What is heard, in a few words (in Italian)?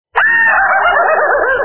Risata; Karaoke 5